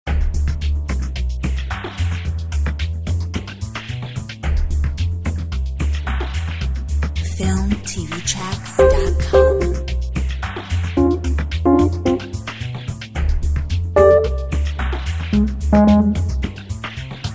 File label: Jazz Station